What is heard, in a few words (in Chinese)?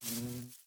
蜜蜂授粉声